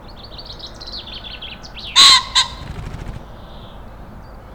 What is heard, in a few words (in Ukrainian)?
Фазан звичайний, phasianus colchicus